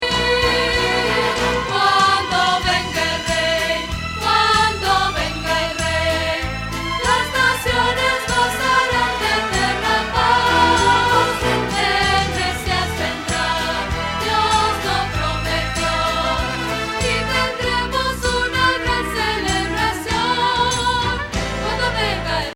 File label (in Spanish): Contralto